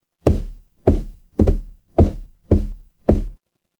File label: footsteps wood